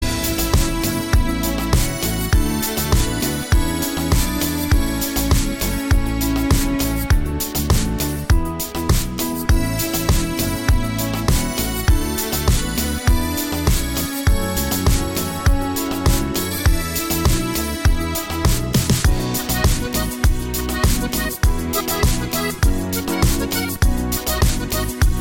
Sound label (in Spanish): cumbia